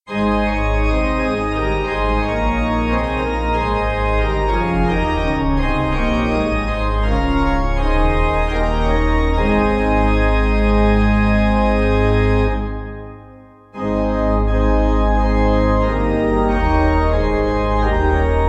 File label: Pipe